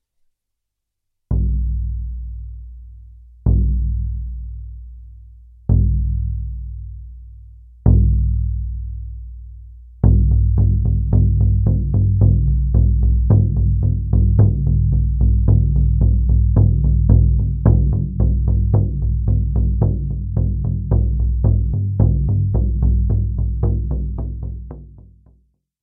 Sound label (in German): Pferd, Trommel